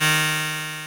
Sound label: buzzer; wario